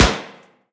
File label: metal sheet, bullet impacts; physics; Half Life Model Pack